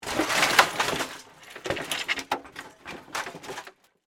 Crash Computer, Crash, Computer, Monitor, Debris, Movement; SoundEffects-BlastWave